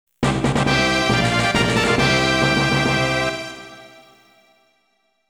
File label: Victory!; Mystic Ark